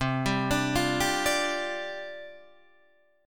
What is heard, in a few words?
C Suspended 2nd Suspended 4th, down, Guitar, DADGAD